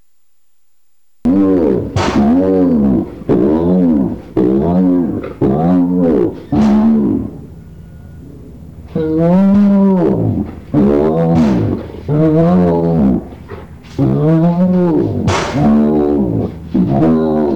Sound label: hyena groan